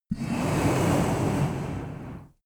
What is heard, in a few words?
reptile breath avarage soft large; kreaturen